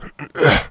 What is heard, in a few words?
Henchman Climb down; Playable Tutorial Level; TR2